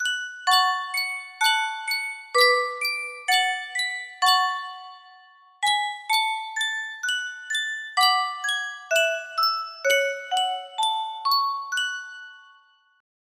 yunsheng music box, melody

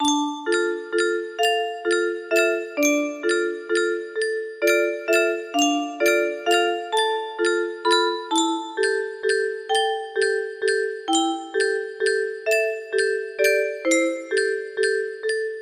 waltz, music box melody

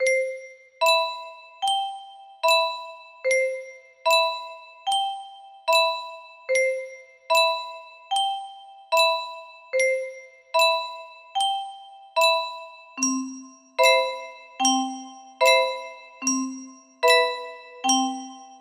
F scale, music box melody

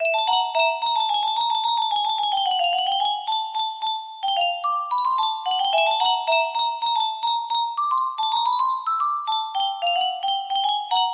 creepy music box

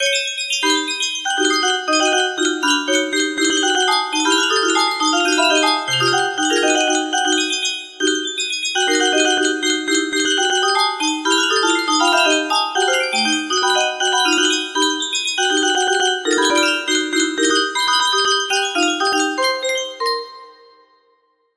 music box, music box melody

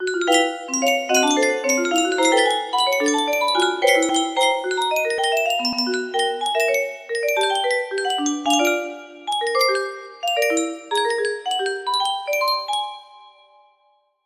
melody

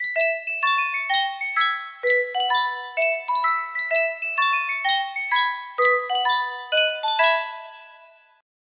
miniature music box; sankyo

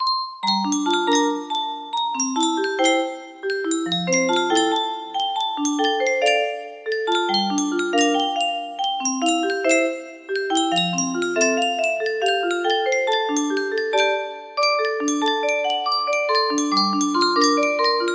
melody

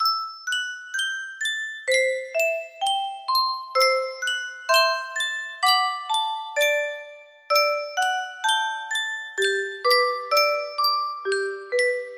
music box

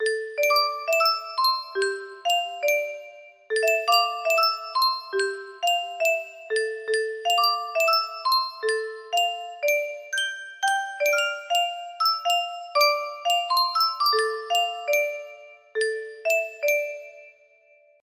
short loop, music box melody

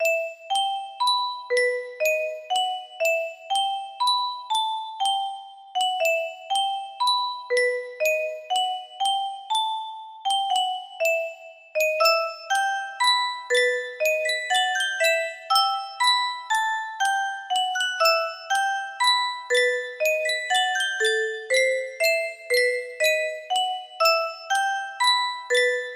music box version, music box melody

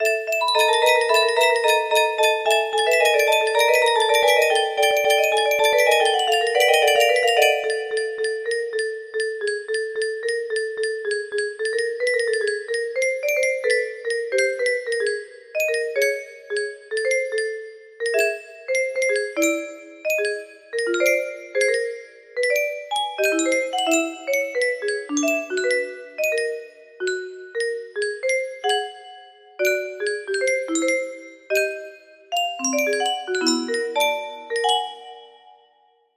melody, music box melody

